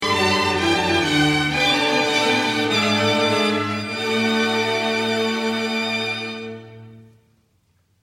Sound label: cadence violin